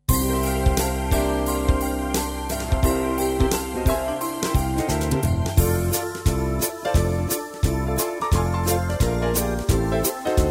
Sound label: Sleighbell Swing; tyros2; Christmas